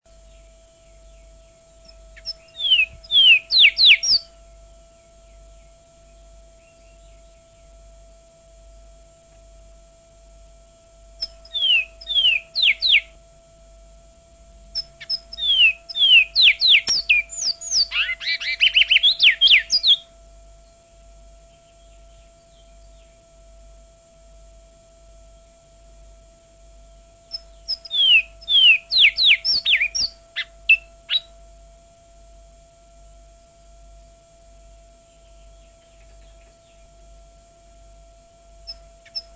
TwaTwa Kiauw2stoot, Surinaamse Zangvogels

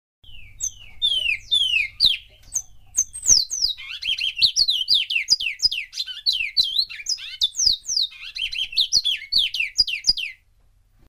Twa Kiauw Ring

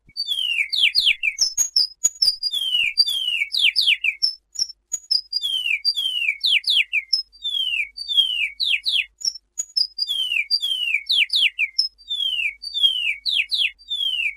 Venuzuela Single 6 stoot

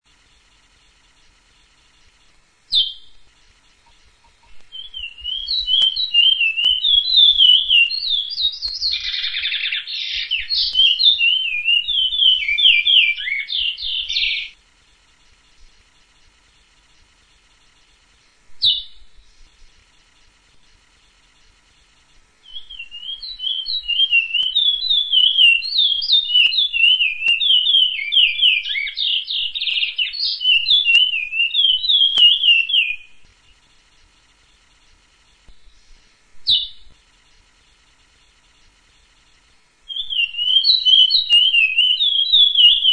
Picolet Pije Pije; Oude Slag

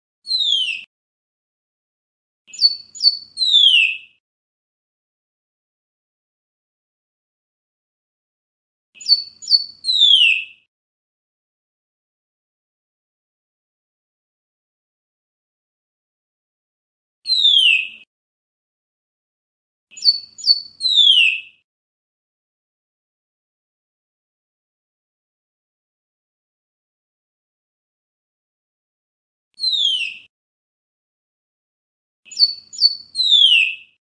Twa Twa Kiauw 1 stoot

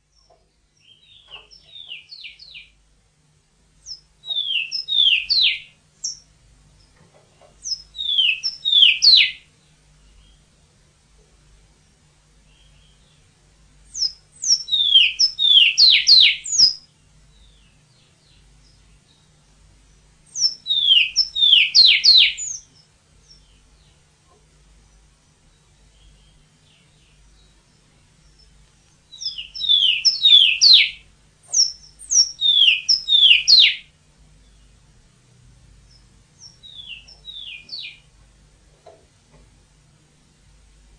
Twa Twa Kiauw 2 stoot; Surinaamse Zangvogels

